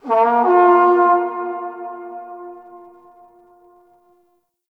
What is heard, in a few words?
Battle Trumpet Horn Call; Warframe; Tau